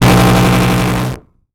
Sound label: Transition Blast Distortion; Adobe; Production Element